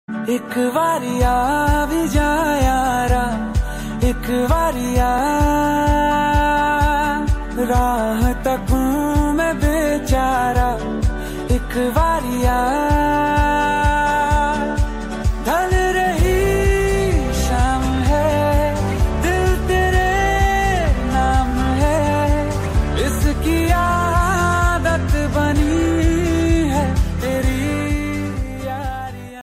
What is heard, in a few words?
hindi status ringtone call ringtone bgm ringtone lofi